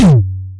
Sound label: Kick, Ghetto Electro Kicks